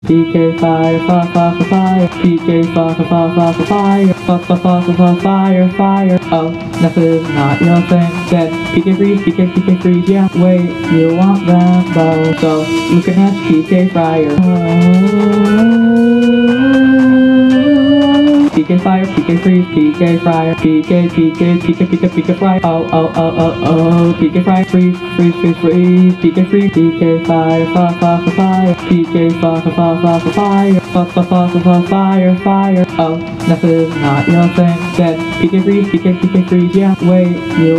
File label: vocals instrumentals